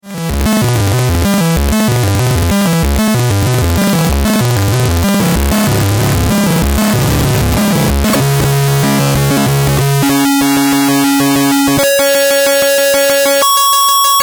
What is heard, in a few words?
a111 h sync; 2台のA-111の矩形波同士によるハード・シンク